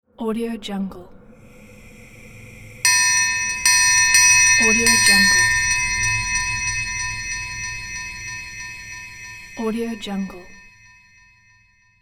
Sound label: ghostly servants bell